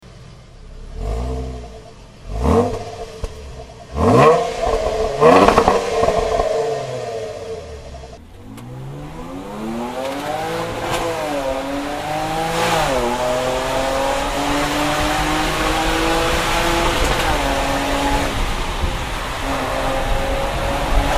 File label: Jaguar F Pace; cars